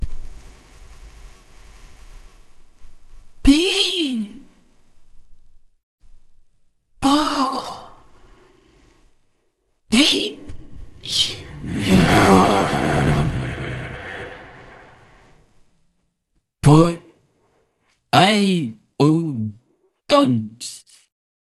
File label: jingle